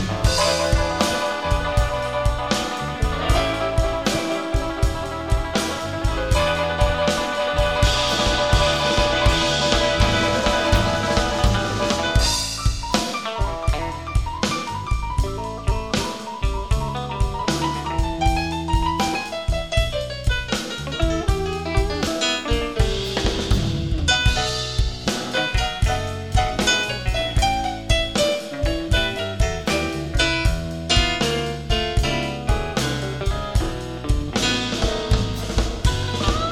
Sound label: Uptempo Pentatonic Slow Blues 6 8 feel 12 bar Piano Guitar Rumbling